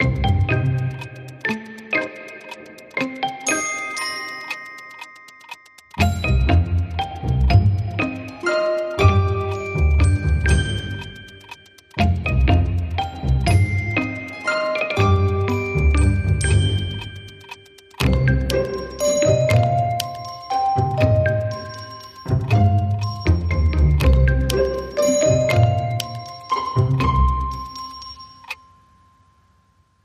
Tick Tock Toy Box

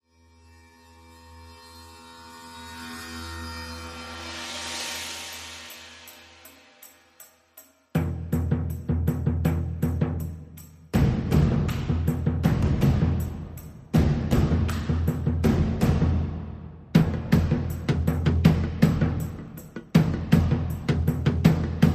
Perc and Pulses

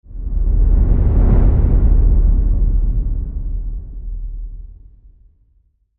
Faint Deep Whoosh Boom

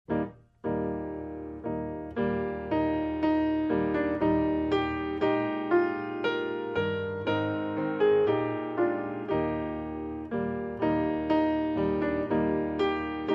Hark The Herald Angels Sing, Solo Piano

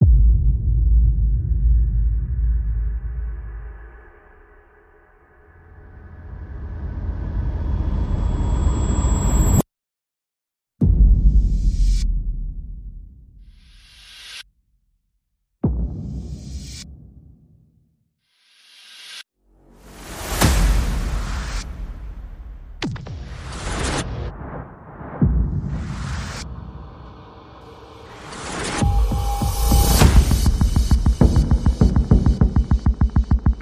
Percussion and Effects

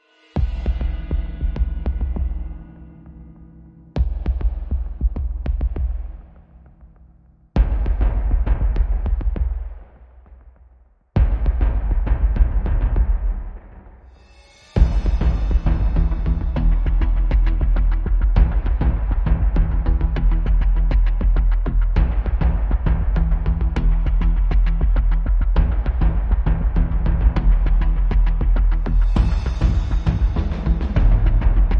Drums and Pulses Only

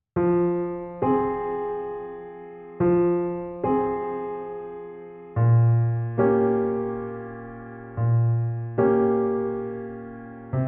Sad Piano Underscore

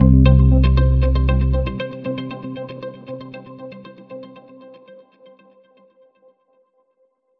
Sunset Indie 808, Sting

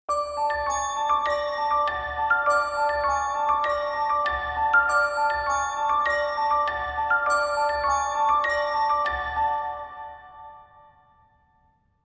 Creepy Piano Version

